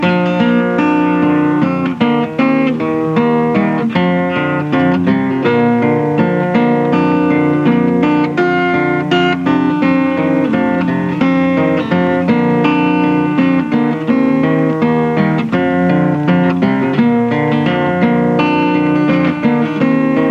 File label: Guitar; Sweet By And By